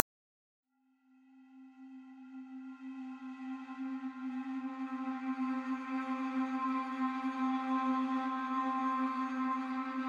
Drone; anno 2205 gamerip 2015; Lunar By Birth

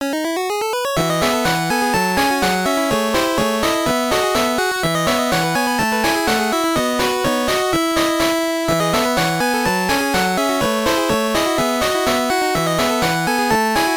BGM; animal breeder 1997 sgb

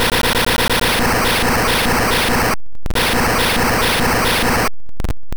Flame Wheel; attack moves; pokemon; gen 2; gsc

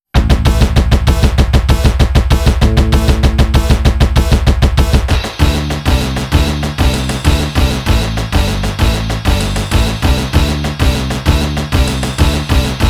tuning-up; Yuzo Koshiro Early Collection Box

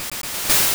Leech Seed Damage; Pokemon; Gen 2; attack moves; GSC

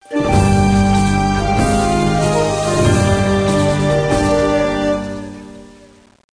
Level Lost; Call of Atlantis 2010; NDS

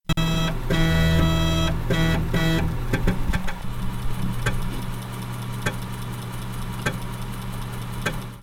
start fdd

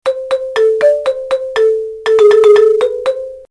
Loops - Xylo; Scratch

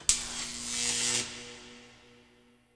spark

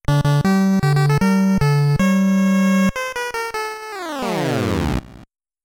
Lost Match; Super Dodge Ball; NES

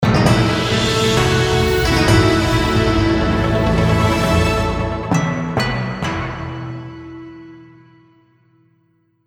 Timepiece Get Jingle; Nyakuza Metro

